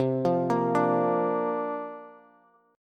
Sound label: C 7th Suspended 2nd Suspended 4th, Bass; arpeggiated; C7sus2sus4